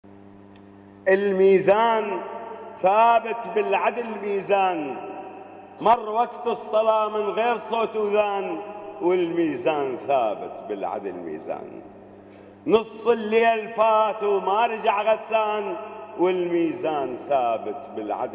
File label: loop